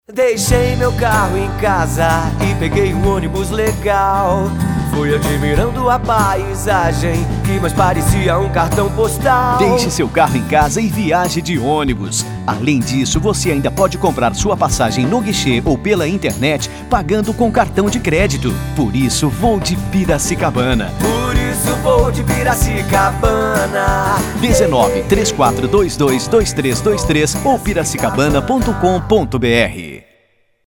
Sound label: jingle; piracicabana